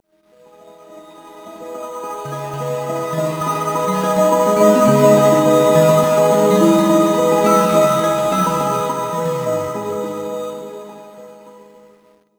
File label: melodies; sona; paxsona